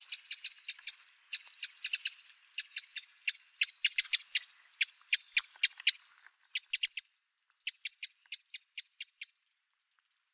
call, wren, calls, Robin and Firecrest on background.; ALTITUDE: +290 m.; troglodytes troglodytes; LAT.N 43°09'/LONG.E 11°34'